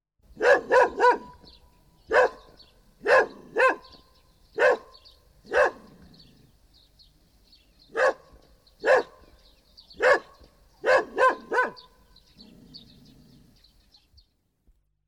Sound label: Dog; SOUND EFFECTS1